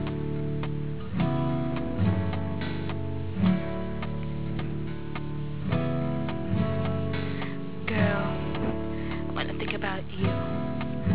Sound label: karaoke